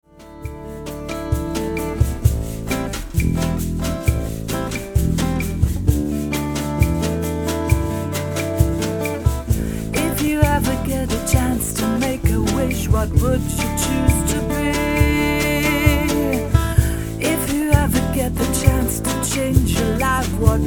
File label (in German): e-akkustisch, chillen